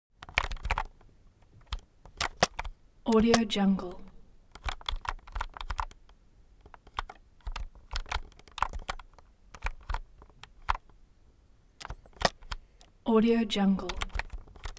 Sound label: foley office keyboard external game sequence long; smartsoundfx